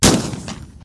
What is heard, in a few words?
shotgun; plague